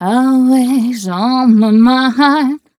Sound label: f mel d, fem Vocals